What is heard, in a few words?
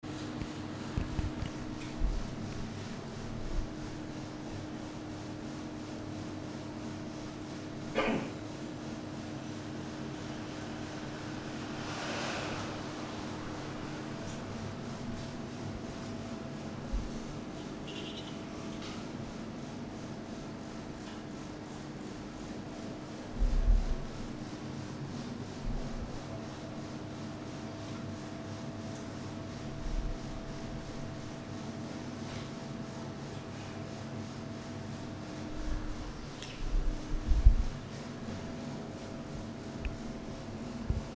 distant clangs; filmscore